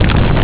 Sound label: burn